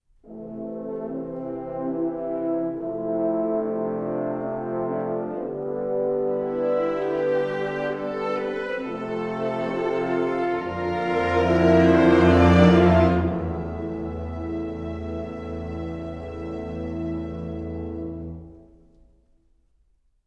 MENU STINGER win; Jumper: Griffin's Story; Xbox 360

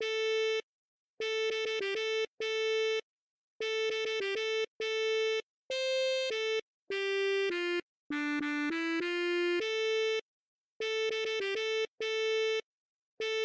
Instrument Saxophone

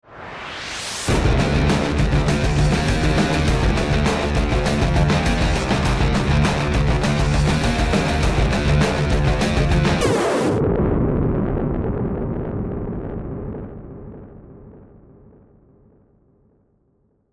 bomb ten sec count; Counter-Strike: Global Offensive; half-life alyx

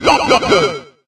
Ghost King-Laugh Fake

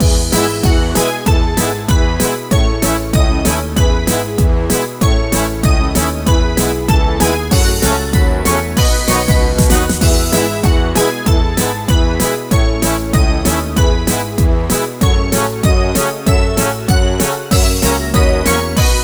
BGM MENU; Active Life Magical Carnival; Wii

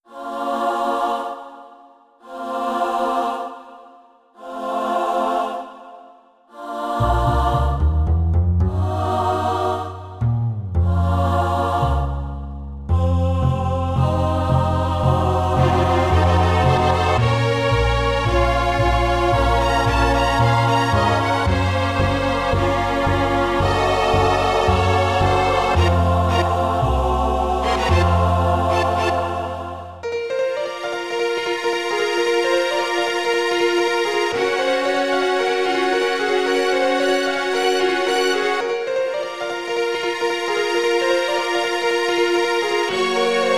Dungeon; Voidspire Tactics

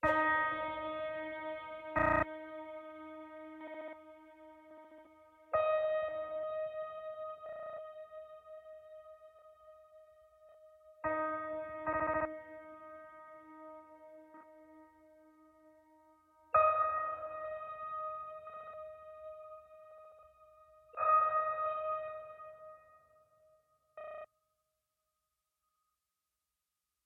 Loading up Piano; Sniper Ghost Warrior 3

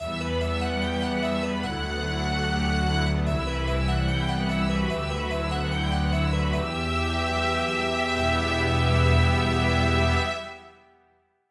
bgm game over; Spelunker Party

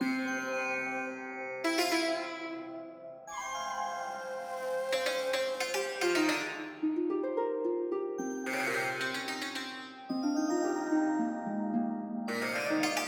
Garden MELODY; Garden v3

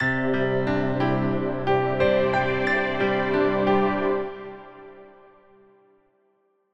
catch; meteor world actor

